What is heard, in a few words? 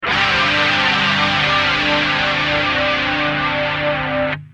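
guitar Dimension C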